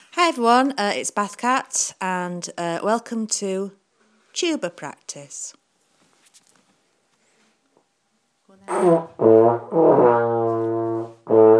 tuba practice

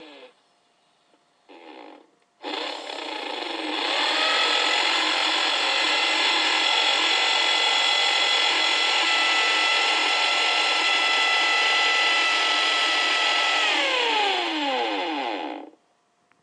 drill for noise action week

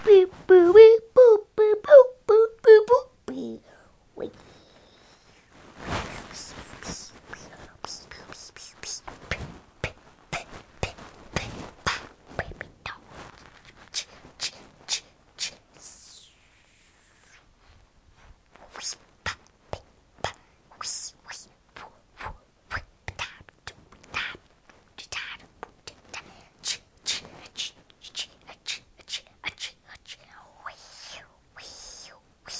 beep

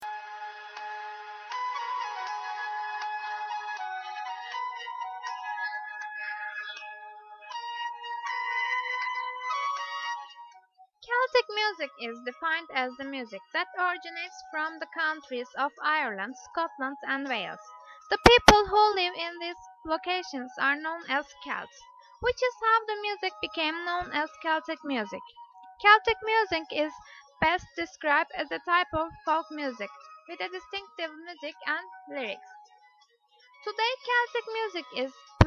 Celtic music

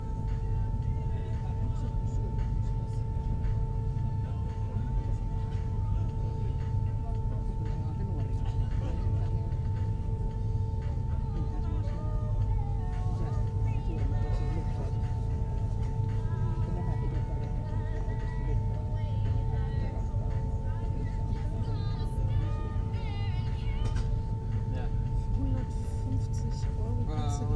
finland ferry to tallinn

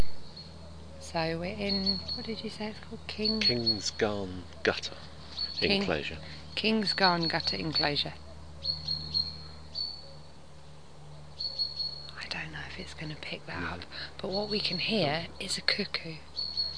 cuckoo

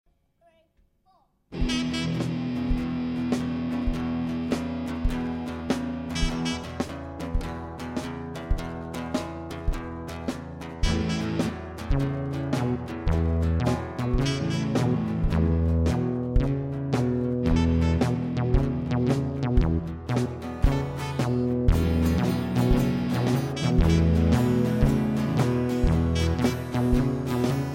school band; pt chevalier school

